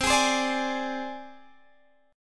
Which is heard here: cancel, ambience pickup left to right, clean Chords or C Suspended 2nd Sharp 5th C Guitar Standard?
C Suspended 2nd Sharp 5th C Guitar Standard